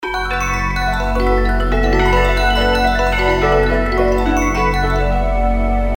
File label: Chimes